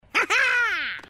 Next Wave; Bunnicula - Ghost Guard

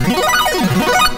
super; Nickelodeon Basketball Stars 3; ThrillGames

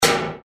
Trashbin Hit; Mr. Bean - Bean Skidding

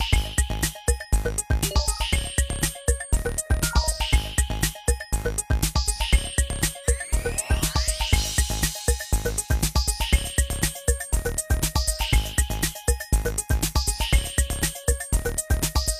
music results loop; Adventure Time - Angry Betty; ThrillGames

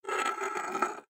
ice slide; Adventure Time - Elemental; ThrillGames

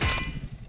land soft; Ben 10 - Hero Time Game

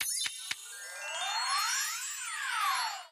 sfx take photo; Dorothy And The Wizard Of Oz - Cookie Magic

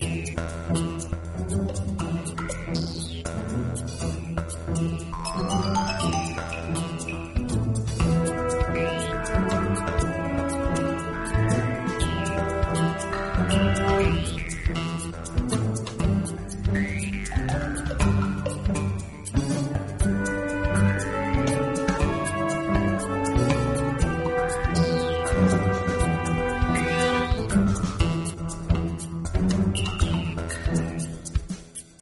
music ingame loop; Bunnicula - Juicy Bites

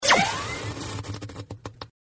ray freeze; Danger Mouse - Ultimate

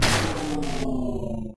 gameover; Adventure Time - Angry Betty; ThrillGames

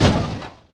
crash; Wacky Races - Sling Races; NextlevelGames

